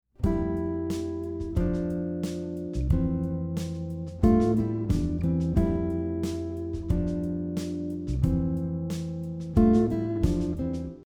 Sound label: 6th below melody example, guitar; how to harmonize a melody on guitar using 3rds and 6ths